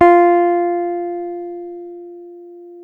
F 4 JAZZ GTR; Univers Sons; Jazzistic

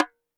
CLAY FRAM, CAMEROON; AKAI S6000 CD-ROM - WorldSounds; CAMEROON PRC

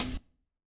KIT, Dance Mega Drums, HIP; Best Service

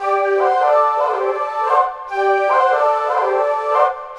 GFlute Riff Cycle; Drones Abstract

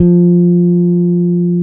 FEND P-BASS F3

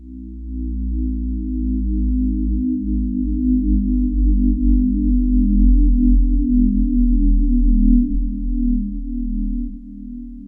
DRONE LOW; Zero-G Ambient 1; WEIGHTLESS

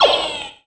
FX Dance

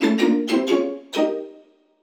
orch sting; musicradar; cartoon caper

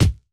kick, Roland MC-909

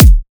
drum kick tight; Virtual Riot; Drum Hits

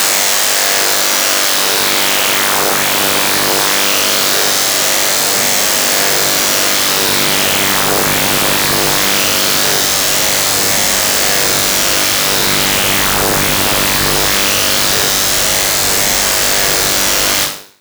Coil, FM; Polyend

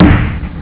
tomtom hard; Amiga Soundtracker Sample Packs

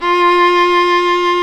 Chamber; Roland L-CD702; Combos 4; Chamber Grp2

